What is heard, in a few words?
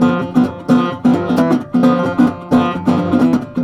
Blues Guitar Samples, riff